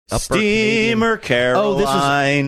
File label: singing steamer caroline